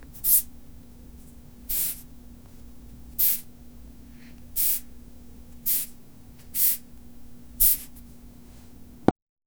applying a generous amoun, skincare

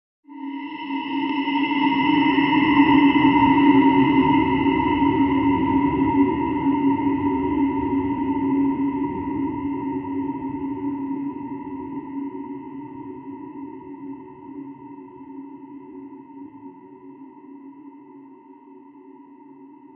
a swamp ambient sound

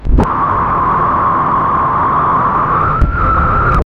surprised yelp with dissi, static

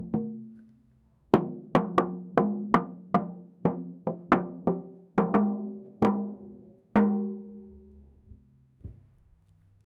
tuba

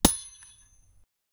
a pickaxe hitting an ore; mining

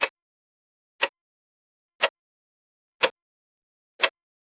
clock tick tock sounds th

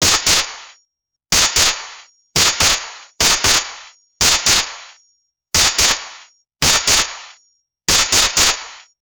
a minigun shooting

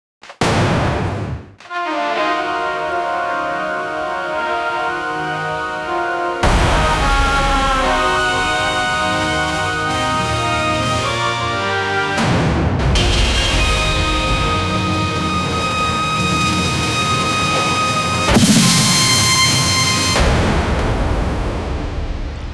full orchestral combat mu, taiko drums

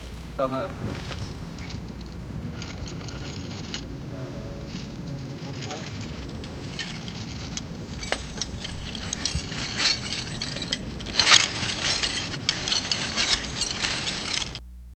soft rustling of paper an; clinks

